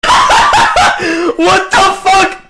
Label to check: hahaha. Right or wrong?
Right